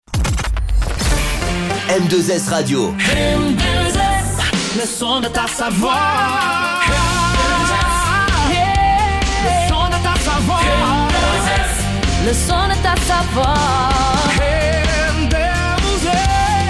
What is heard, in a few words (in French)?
Jingle Chante; M2S